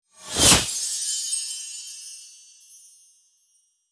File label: Magical whoosh transitions christmas fast transition